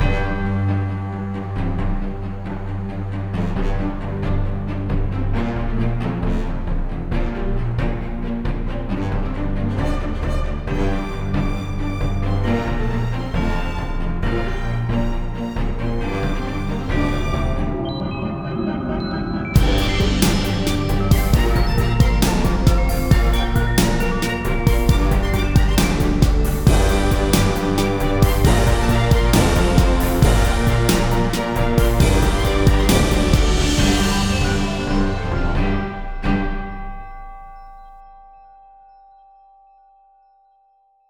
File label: Full Symph